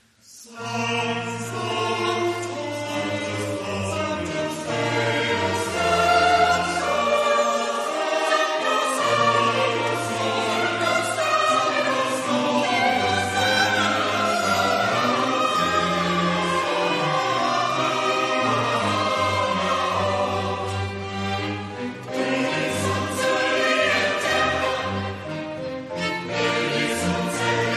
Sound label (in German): sanktus, chorgemeinschaft, kirchenmusik; brigittenfest